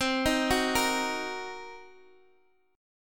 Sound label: C Minor 7th Flat 5th Guitar Open G, chord